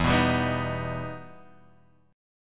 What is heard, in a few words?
E Minor Major 7th, Guitar, Drop D; 2,2,5,4,4,x; down